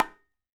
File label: KHOL, DRUMS OF IND; AKAI S6000 CD-ROM; WorldSounds